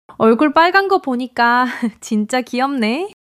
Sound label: laughter